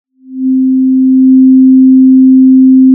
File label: Sound of a cruise ship horn